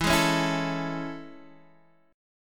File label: A Major 7th E